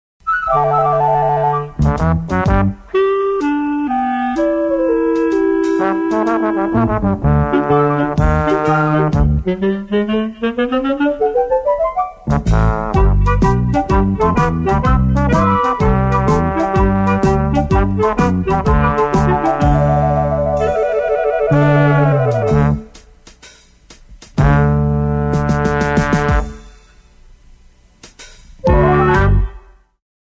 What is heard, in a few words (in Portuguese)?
Trilha; IpanemaFM; Spots Agencia Jinga